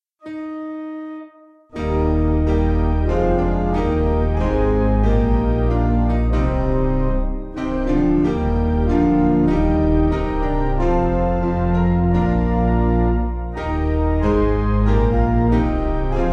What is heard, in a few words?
O Piano